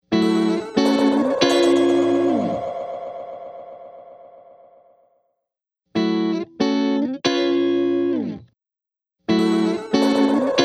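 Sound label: Guitar Octave Decays, Electric Guitar; H3000 Factory Mk II; Preset: Silversmith Oct Decay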